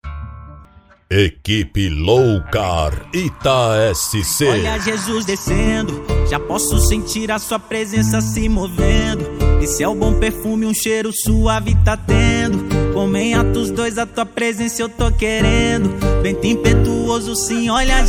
gospel

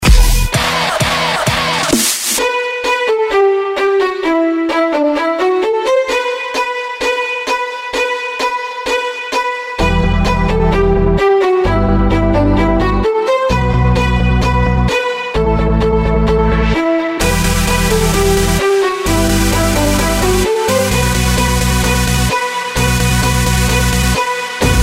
electro house

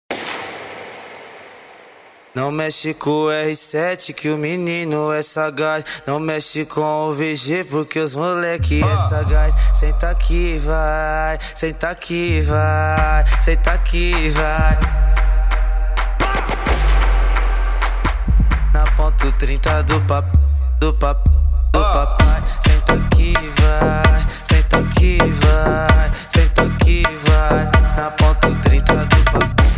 funk

